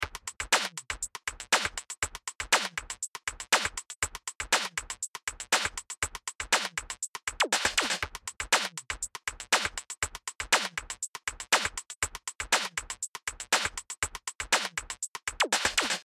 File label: drum fein; bx refinement; bx saturator v2; filter plugins; brainworx